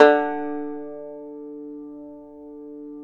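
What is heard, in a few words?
BANJO D2; Synclavier Sampler Library; KEYS AND GUITARS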